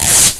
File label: hit, IMPACTS & HITS; Techno Trance; FX & ATMO; Techno Trance Essentials